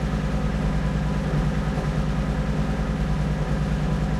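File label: cromwell engine interior rpm; cannons; War Thunder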